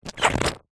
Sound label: cartoon rubber hit wobble; zapsplat